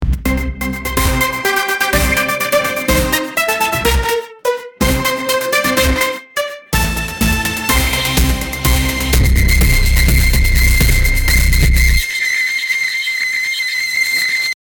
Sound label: sound for the intro of, phonk